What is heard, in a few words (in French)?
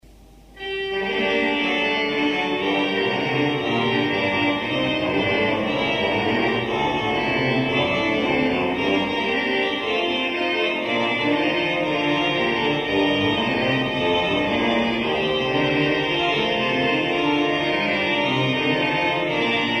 Trompettes, organ; orgue Clicquot de Saint Nicolas des Champs sur les "Trompettes"